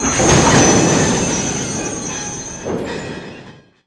Mozdony Leallas; Pure Pinball

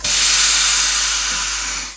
Goz; Pure Pinball

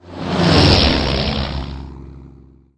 repulo; Pure Pinball

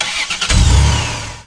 start gomb; Pure Pinball

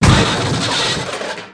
karambol; Pure Pinball

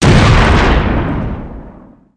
agyu; Pure Pinball

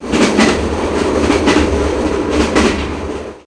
Mozdony; Pure Pinball

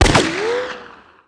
pisztoly; Pure Pinball

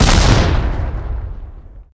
tank ágyú; Pure Pinball

